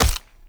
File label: Raw Meat Impact; Gameplay; Awakening Remaster